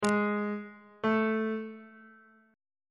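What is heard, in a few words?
a diminished 8th perfect 8th intervals on bass clef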